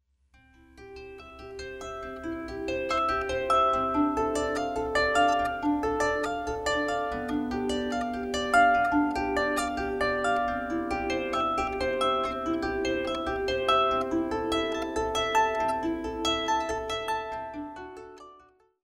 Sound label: Prelude in C, harpist